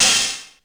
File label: Open Hat, Ensoniq ASR-X Pro